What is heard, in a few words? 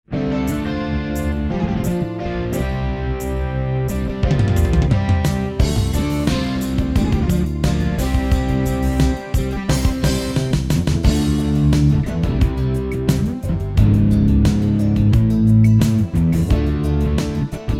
backing